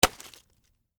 Stab Cabbage Head, Hit, Vegetable; Texture; Food Sound Effects; SoundEffects-BlastWave

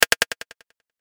CLICK DELAY; Clicks (Sound Effects:Multimedia)

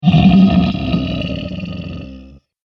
Zombie Death Breath; Zomby Sound Effects; horror

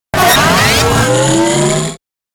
Fast Blip Warp; Production Element Imaging Element Accent Transition; Beep; 5.1 STEREO MIX; Blastwave

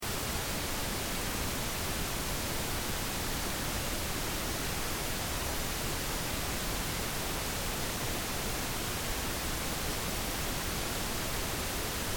Test Tone Pink Noise; Technology; Tone Sound Effects; Tones (Sound Effects:Sound Design); SFX Bible 6

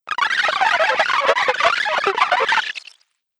Arcade Game Retro Confusion; Video Game Sound Effects; Retro Inspired Sound Effects - Think Nintendo, Atari, C64, Etc.; Andrew Fleming

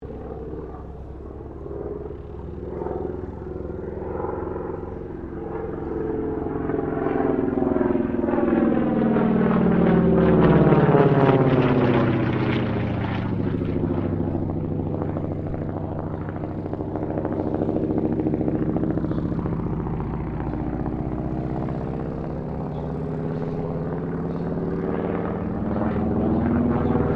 AIRCRAFT AT-6D HARVARD MK III LOOP; Harvard Training Aricraft; Airplanes; Vehicles

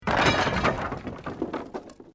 Wood Collapse

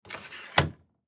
Computer Desk; Office; Blastwave

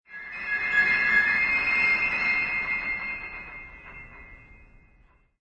Creepy Piano

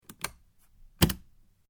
Suitcase Latch Open; Travellers case; Latches; Objects

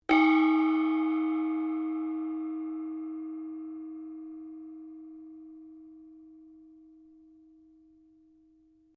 GONG CHINA SMALL RING, Chinese gongs; Cymbals; Musical

